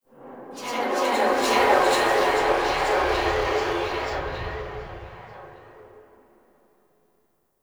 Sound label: Whisper; Tenno; Warframe; Lotus Eaters